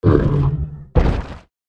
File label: golem dying; character voices english